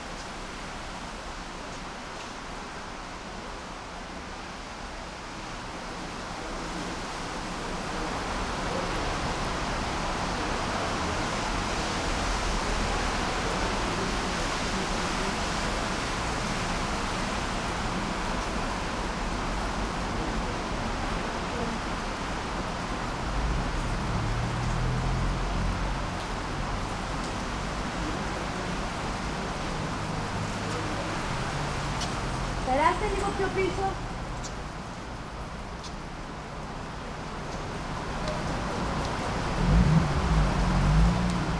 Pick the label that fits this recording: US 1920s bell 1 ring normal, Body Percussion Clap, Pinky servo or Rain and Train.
Rain and Train